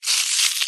hospital syringe squirt gel medicine into plastic container